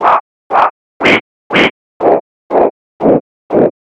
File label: Noise Formant P